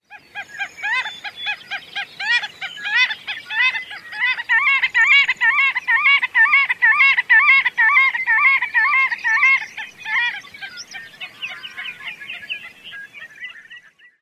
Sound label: raddica-raddica courtship song